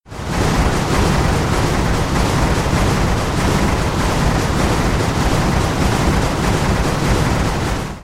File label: Sprint move; Slardar; Guardian